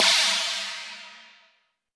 flange china; Drums In Your FACE!

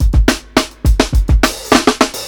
105 BREAK, DRUMS LOOPS; BASEMENT DRUMS VOL 2

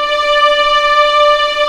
D5A LEG P, VIOLINS; East West; The Ultimate String Collection

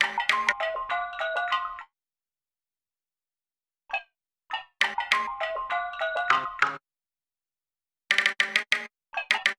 Melody, Hip-Hop

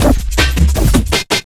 LOOP L, Drum & Bass 160 BPM

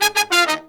Pop & Funk Brass

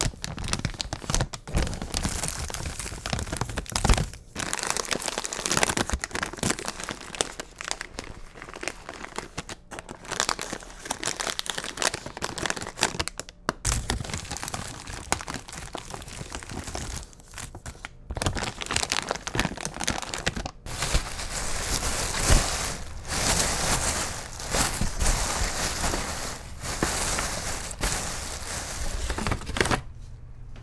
Plastic Bag, kitchen

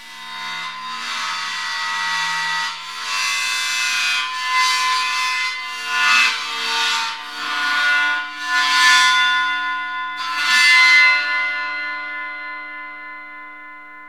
BOW CYMBAL, BOWED CYMBALS; Zero-G Ambient 1

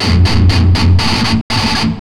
Riff, Industrial; Nasty

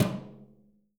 BRUSHES, Living Drums

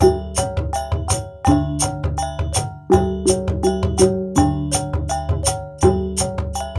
WYANG L, Heart of Asia

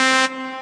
Synths Root C

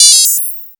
MOOG HAT; AMG - Vince Clarke Lucky Bastard

